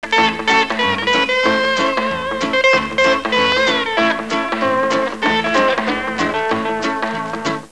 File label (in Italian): musica strumentale